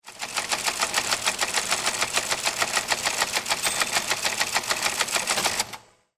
Posthaus Fernschreiber T100 Siemens; von 1962

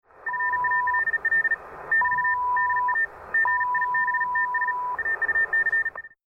Amateurfunkbetriebsart schnelles Morsezeichen; FunktechnikAusstellung

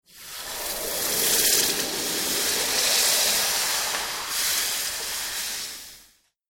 Schmied Abschrecken in Wasser

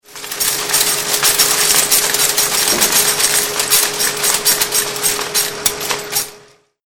Druckerei Lino Type Zeilensetzmaschine

